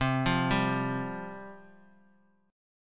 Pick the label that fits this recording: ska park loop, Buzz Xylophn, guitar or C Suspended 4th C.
C Suspended 4th C